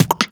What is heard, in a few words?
Beat Box Drum Fill; Aawaz